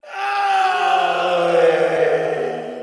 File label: human scream; cstrike; biohazard